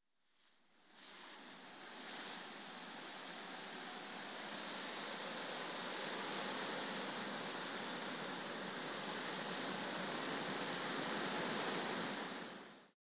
Water flows in the shower

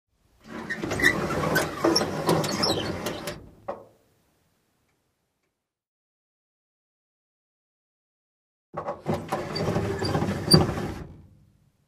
Car glass lowered, raised manually

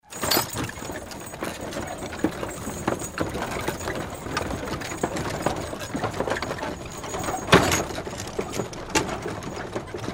Horse cart movement

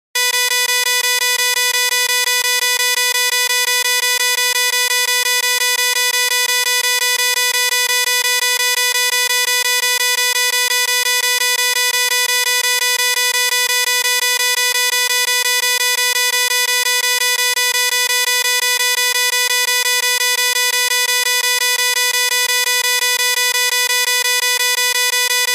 Computer bios beep, bios

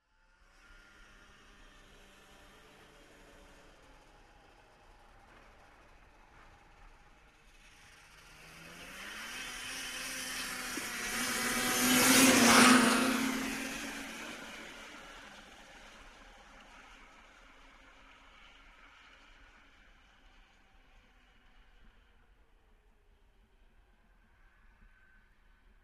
Snowmobile passing by

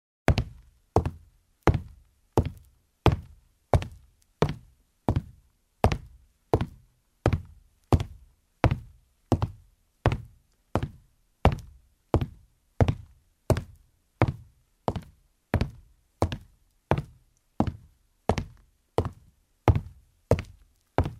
Steps on the wood floor in shoes

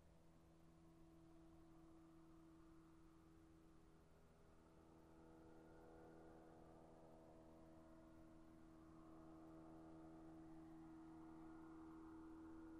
Motorcycle passes by quickly

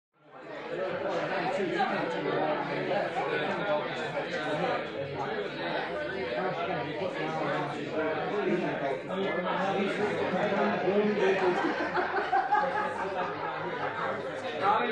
Conversations, laughter, small crowds

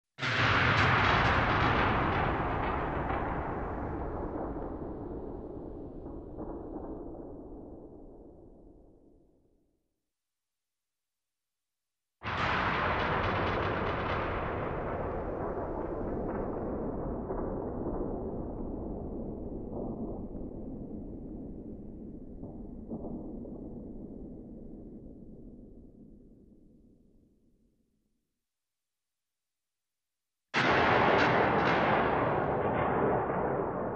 Explosion in the distance; explosions

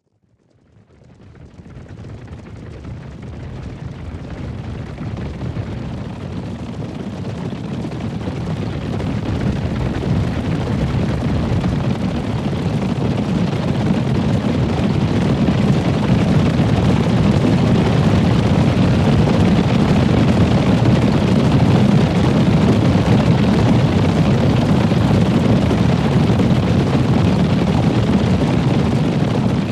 many horses gallop

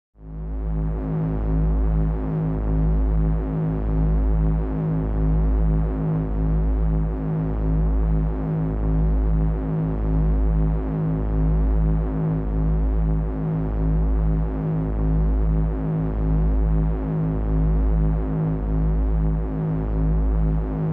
Pulsating signal with low frequency and hum; radio signals

